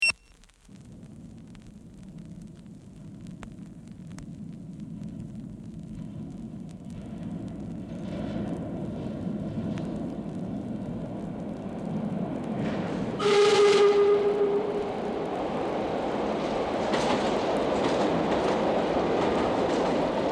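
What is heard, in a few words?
Train passing in station (in tunnel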